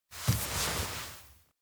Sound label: turn away soft; spica; anemoi